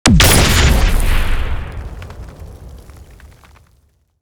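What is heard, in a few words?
tripmine explosion boom; weapons; Half Life Alyx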